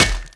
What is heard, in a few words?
paint can impact